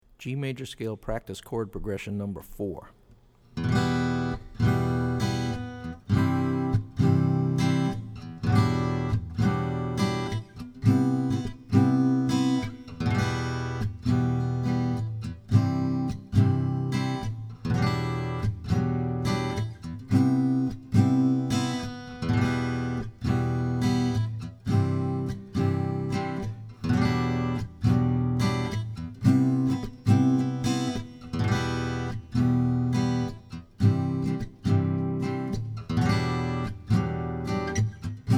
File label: G Maj Scale Prctce Chrd Prog; lead skills materials